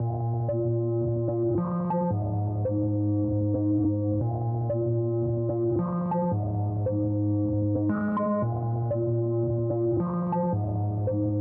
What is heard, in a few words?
114 BPM D# Min Synth, loop stems; Cymatics; 2022 Melody Collection Platinum Expansion